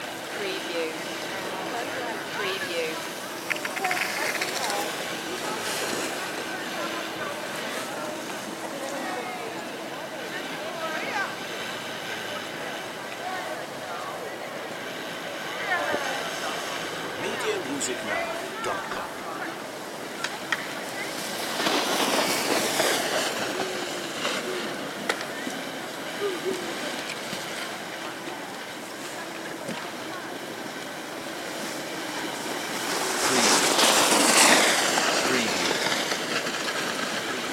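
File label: Ski Slope Ambience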